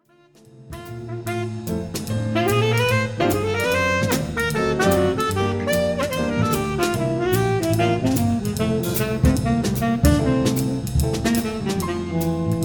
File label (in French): extrait swing